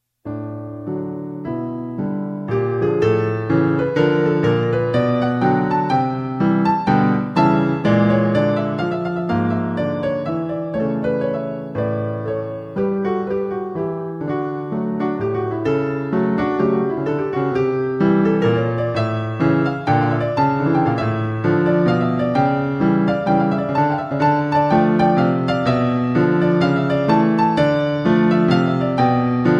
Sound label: piano sonata